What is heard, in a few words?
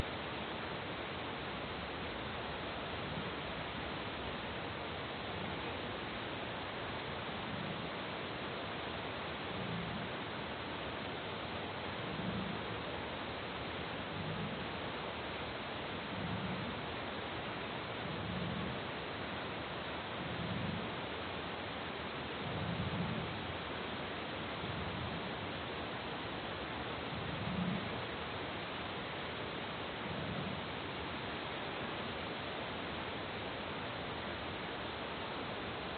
north pacific right whale